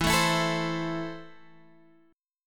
E Suspended 4th Sharp 5th E, down, Guitar, Half Step Down